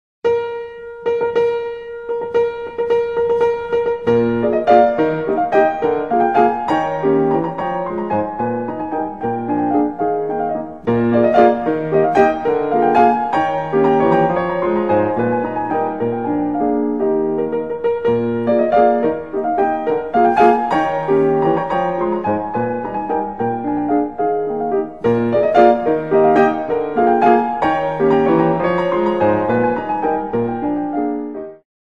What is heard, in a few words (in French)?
Grande Valse brillante; Chopin